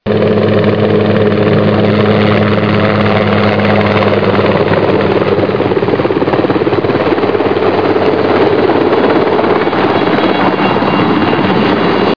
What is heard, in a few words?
Helipad, aircraft sounds